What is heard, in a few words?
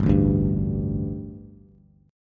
G Suspended 2nd G, down, Bass; Gsus2 strummed; JGuitar